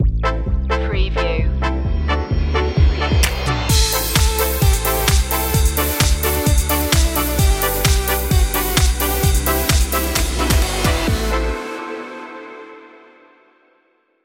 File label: energy ska; 15s